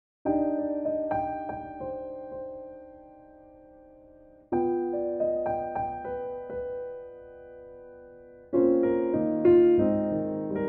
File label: C piano Verse